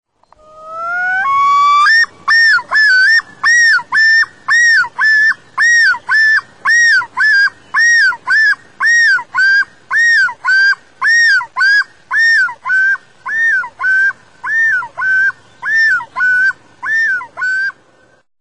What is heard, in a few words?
loon yodel; loon calls